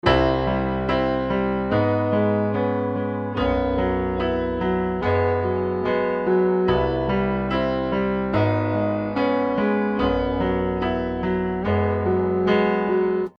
piano, stereo

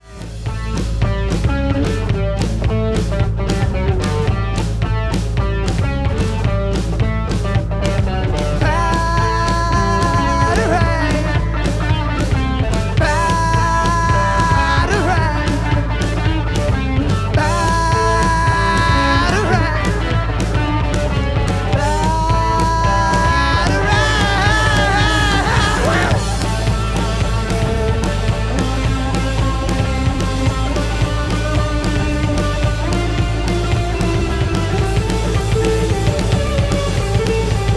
Original Mix

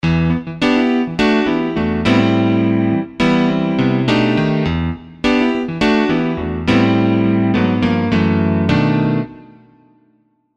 Electric Grand

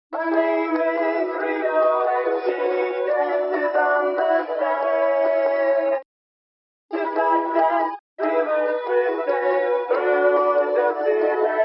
Vocal Isolate; Alloy; RX2

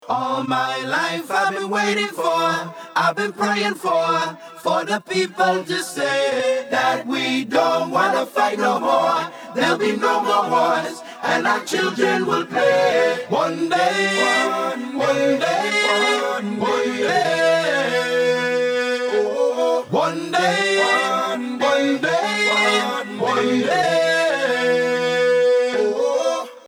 bg vocals

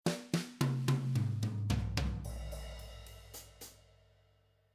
BRUSHES

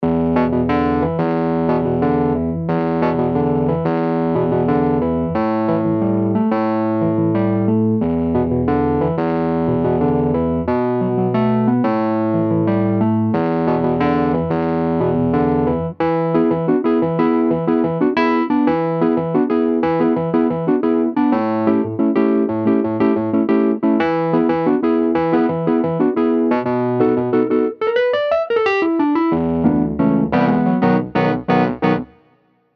Wurly Classic High Dynamics

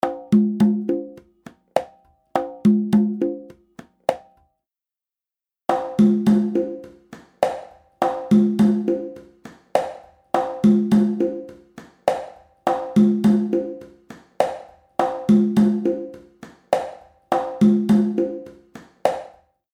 Congas Dry and Altiverb

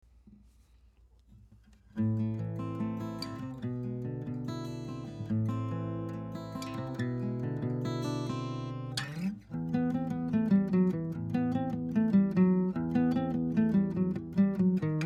Acoustic Presence; Spark

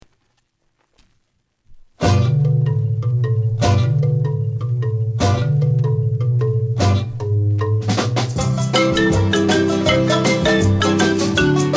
Vinyl Recording

